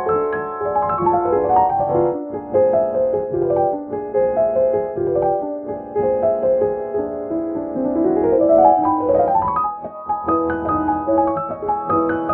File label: right Hand Wiener Filter; Ravel Jeux D'Eau; 2015 WASPAA Separate And Restore